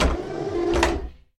korotkij zvuk proverki raboti dvigatelya

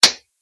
stuk v fortochku

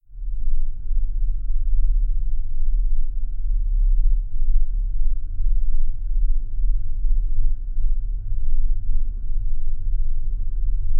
nizkij tihij ele ulovimij zvuk

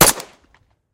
uverennij professionalnij vistrel

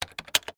kompyuternaya klaviatura v svoyu ochered nazhmite klavishu vvoda v neskolko klavish

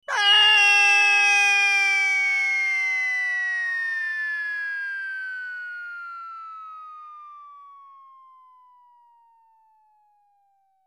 muzhskoj krik pri padenii s visoti komichnij

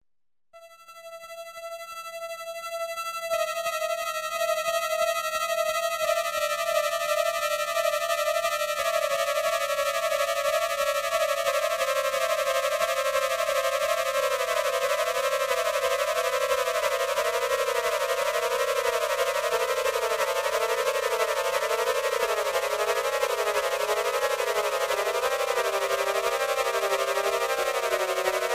sintezator napryazhennaya melodiya

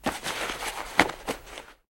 uborka nenuzhnogo snaryazheniya v ryukzak

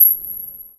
knopka suhaya visokii zvonkii rezkii yarkii

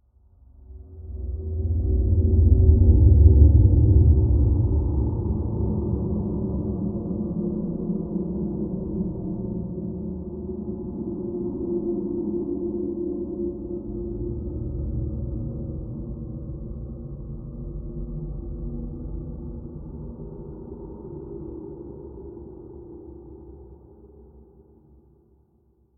horor dom uzhasov

